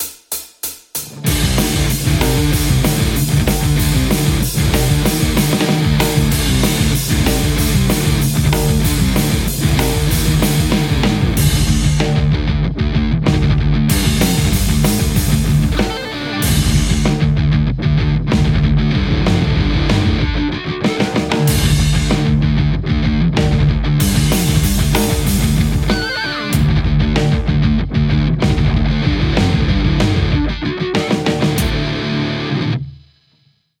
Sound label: metal Les Paul; kuassa lite